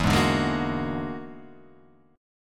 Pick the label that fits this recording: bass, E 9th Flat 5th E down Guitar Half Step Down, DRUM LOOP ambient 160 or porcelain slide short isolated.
E 9th Flat 5th E down Guitar Half Step Down